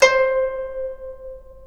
GUCHIN C4; INIS - Opium

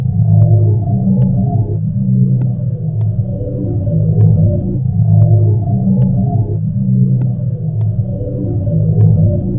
Aetherial Drone; Sonic Foundry (Sony Creative Software); Loop Noir; Session 12 - Otherworld Journey

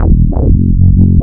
BRAIN BASS; Big Beat Samples & More

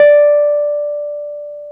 PNO Wurly

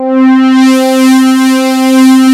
BIG PAD SWP, OLD SYNTHS; Rubber Chicken Software Sample Bank

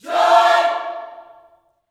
JOY CHORD, Gospel, Vocal; ILIO; Vocal Planet

